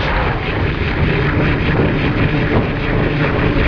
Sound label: lil door; race-into-space